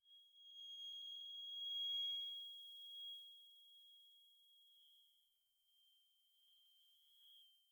futuristic

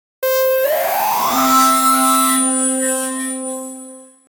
fantasy power sound

